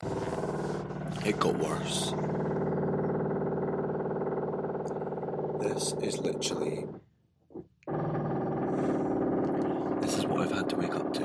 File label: worst alarm clock ever